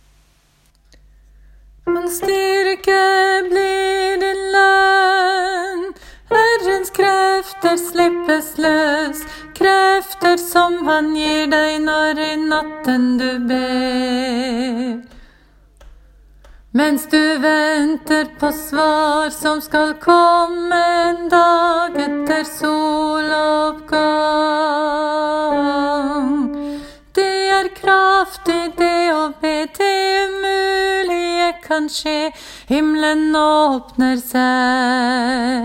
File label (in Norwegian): refreng alt; åfjord